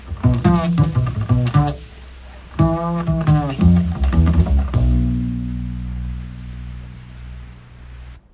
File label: acoustic jazz bass riff; MELODIC SAMPLES